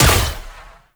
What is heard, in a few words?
Derelict Prime Gas Arrow Explosion Hit; Infested; Cernos; Weapons